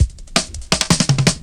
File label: Rim Shott Cut, Drum Loops 166.5; Zero-G Jungle Warfare 1, 2 & 3